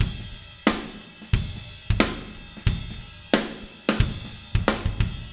090 Shuffled Rock Drums Chorus; Pop Rock; Moderate Tempo; Pro Tools Essential Loops; Digidesign

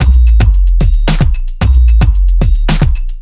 Dance Beat; Pro Tools Essential Loops; Dance DJ; Beats

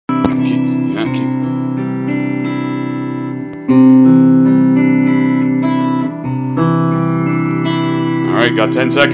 Cool C strum

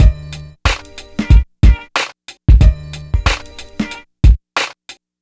HipHop West Side Combo G min; Digidesign; Pro Tools Essential Loops

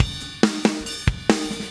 140 Groovy Fast Drums, Very Fast Tempo; Pop Rock; Pro Tools Essential Loops; Digidesign

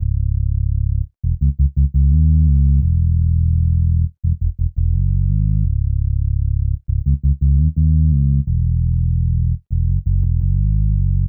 Pop Synthbass C#; Pop Rock; Pro Tools Essential Demos; Digidesign; Essential Loop Demos; Demo Session - Pop Rock

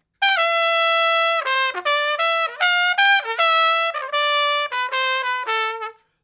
160 Trad Jazz Trumpet Solo _Dmaj; Brass; Pro Tools Essential Loops; Digidesign

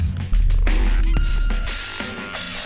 Loops; Pro Tools Essential Demos; Digidesign

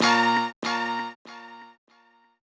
HipHop Guitar Echo A#Min; Digidesign; Pro Tools; Pro Tools Essential Demos; Essential Loop Demos; Demo Session - Hip Hop

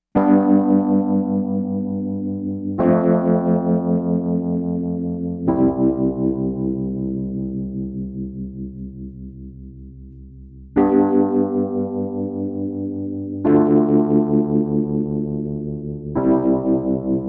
Wurli; Pro Tools Essential Demos; Digidesign

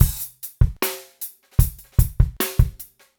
075 Pop Busy Ballad Drums; Pop Rock; Slow Tempo; Digidesign; Pro Tools; Pro Tools Essential Loops

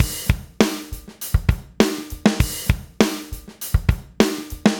100 Laid Back Drums; Pop Rock; Moderate Tempo; Pro Tools Essential Loops; Digidesign

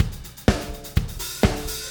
125 Disco Drums, Fast Tempo; Digidesign; Pro Tools; Pro Tools Essential Loops; Pop Rock

